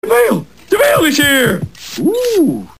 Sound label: Mail Here, ringtones; funny